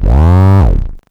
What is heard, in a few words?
Synths G; vengeance essential club sounds-3